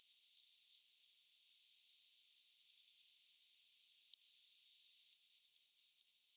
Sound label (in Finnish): mika laji ilm rastaslaji tavallista korkeampi ja hennompi kuin viheltava rastaan sirina; kooste